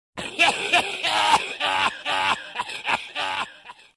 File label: SFX Prison Laugh; Escape from Russia: Mobilization